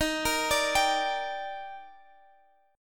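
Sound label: E flat Major 7th; x,x,13,15,15,15; arpeggiated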